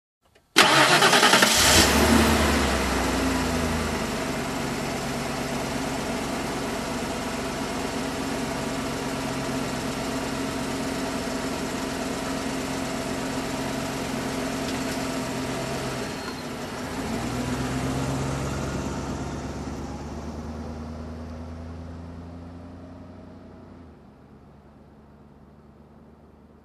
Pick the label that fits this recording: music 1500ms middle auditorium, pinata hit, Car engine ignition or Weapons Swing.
Car engine ignition